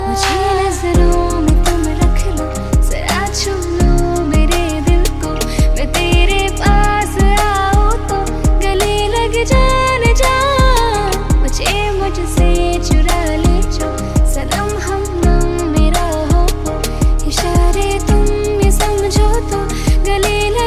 hindi ringtone, bollywood ringtones

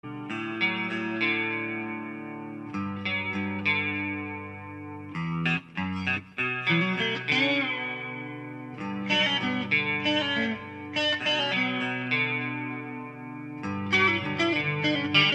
musical ringtone for mobile

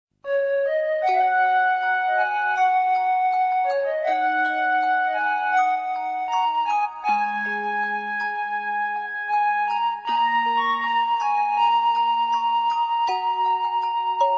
calm music

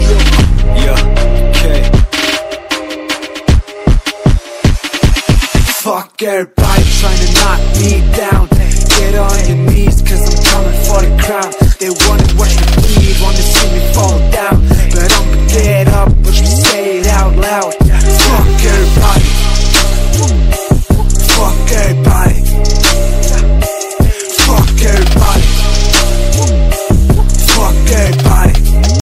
english ringtone; english ringtones

